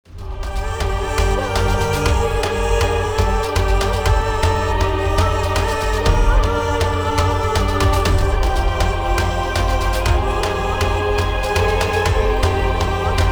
combat loop low; Epic of Kings

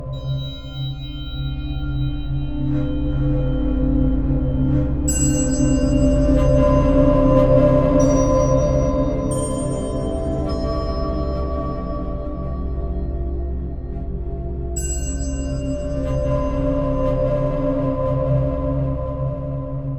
Creepy Cemetary Soundscape; Dungeon of Elements

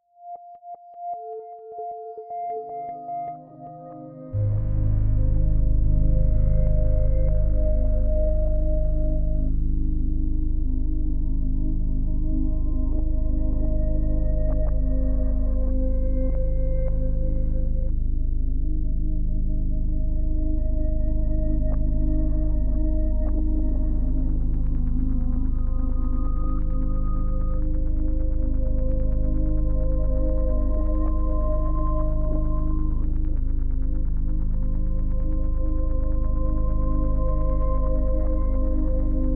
music toilets

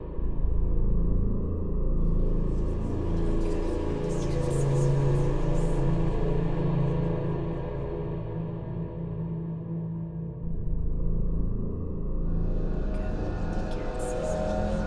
Danger Catacombs East; Eon Altar

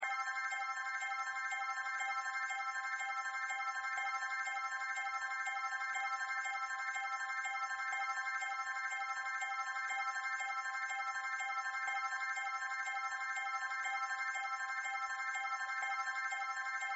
Jingling; Cookie Jam

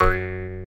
Start Game; Pinguinos Biri Game; Android

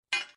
Pick Up Crow Bar

